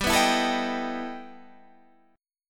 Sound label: E Minor Major 11th G, guitar